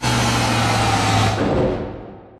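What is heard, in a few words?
Assembly Plate Lift Down Rock Distant; Boomtown; Titanfall 2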